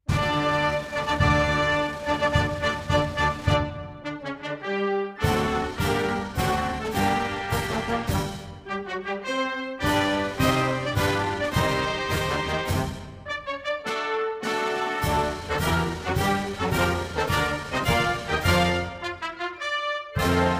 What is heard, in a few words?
anthem instrumental